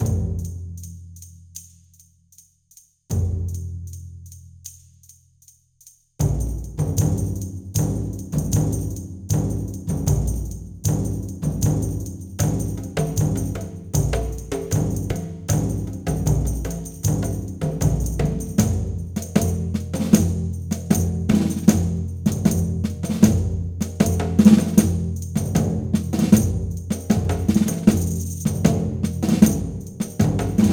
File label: Music Speed Up Percussion; 7 Mages